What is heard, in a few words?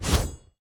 Attack Heavy